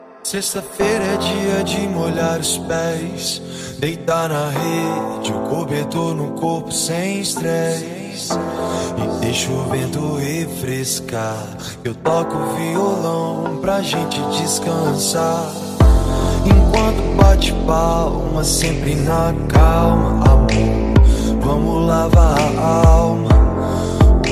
rap house

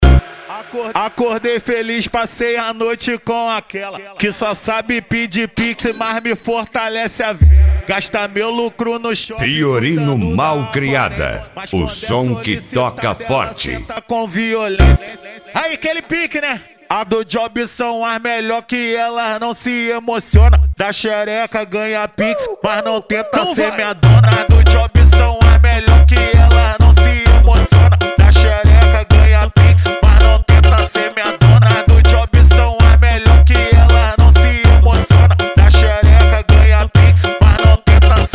mega funk bass

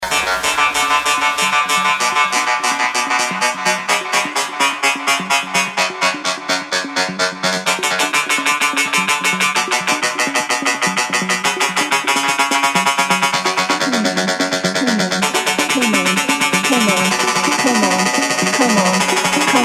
progressive eletronic music

